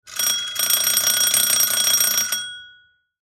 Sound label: Doorbell Ring; Doorbell Ring Old; Household; Doorbells; Sound Effects:Doors